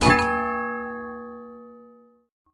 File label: Drop Bell